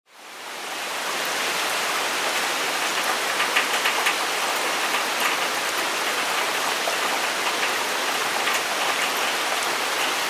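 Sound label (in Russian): ровный мелкий сильный; Звук дождя; Звуки дождя сильного, моросящего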